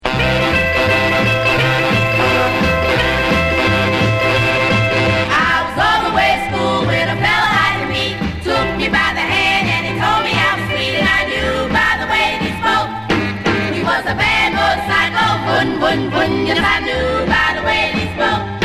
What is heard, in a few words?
Bad Motorcycle